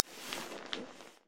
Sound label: Bed Rustle